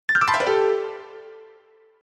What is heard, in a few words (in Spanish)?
piano